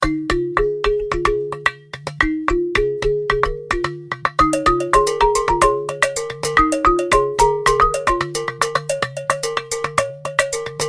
African Tone, ringtones; Tecno Spark Go 2023

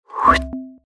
Send a message, notifications; Rise 54; Orange

